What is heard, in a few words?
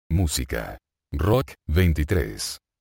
rock